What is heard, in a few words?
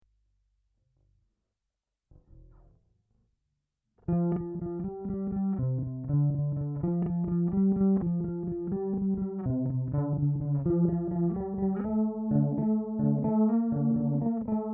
detune deep, whammy